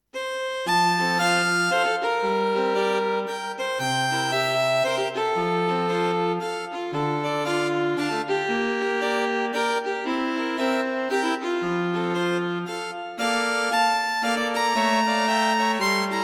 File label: String Quartet; String Quartet for Concert performance; A happy stroll through the forest.; A modern piece with plenty of harmonic and rhythmic interest.